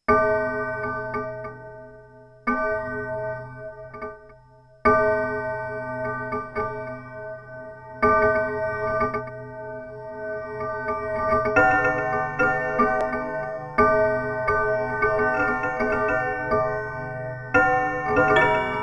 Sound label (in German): Soma Cosmos 6 Uhr FB1 Drift 100